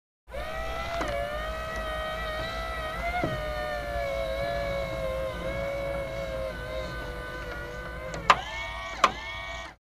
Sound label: Antenna Car Electric, Telescoping Antenna Up And Down